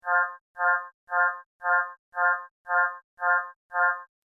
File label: Basic Alarm Loop; Alarm Sound Effects; Andrew Fleming